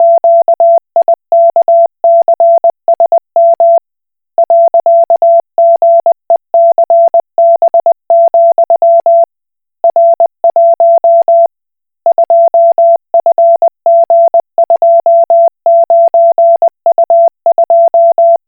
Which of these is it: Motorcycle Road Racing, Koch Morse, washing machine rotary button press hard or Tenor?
Koch Morse